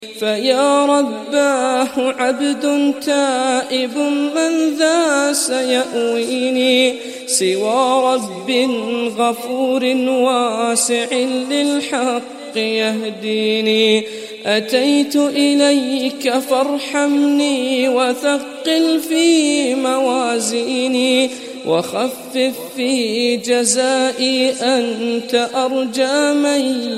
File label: islamic Ringtone